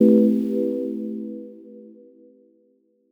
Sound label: stab cypress F, Noise; stabs